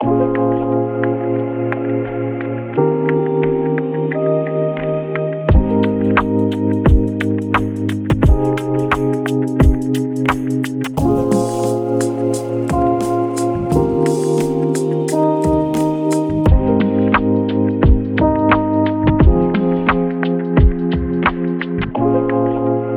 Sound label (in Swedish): djingel